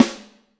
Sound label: WARM ROOM, SNARES; Back In Time Records; Big Bang